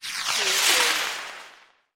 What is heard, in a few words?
Rocket Launch Pass By; sound effects bible